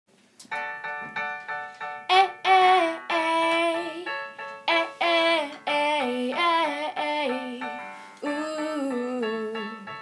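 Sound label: intro verse with backing track, singer drumm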